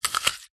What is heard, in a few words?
human eat bite apple